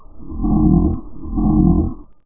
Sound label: jungle, ambience; QUAKE